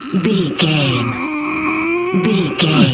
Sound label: voice male effort push slow